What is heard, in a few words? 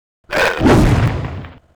attack